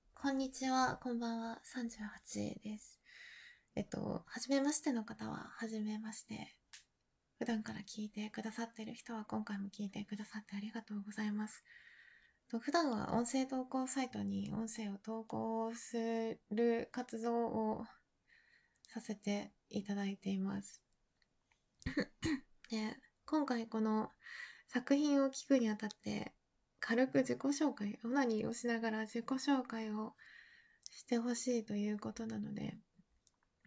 バイブ セルフ手マン; 遠隔２点責めバイブ装備で自己紹介からのセルフ手マンでぐっちょぐちょ

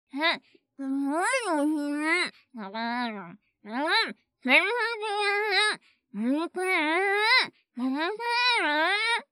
モグモグ(喋りながら)

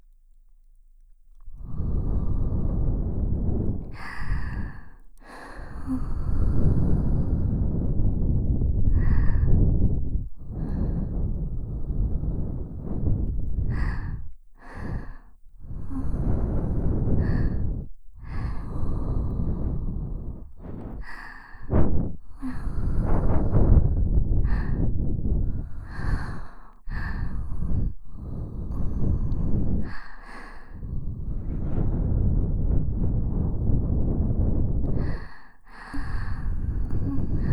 お耳ふーふー

